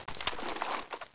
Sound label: saw inspect, weapons